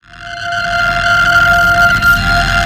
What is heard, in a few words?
light ray charge up; dogma